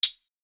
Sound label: hat shaker; Drum Sample Pack; One Shots; Slate; SEISMIC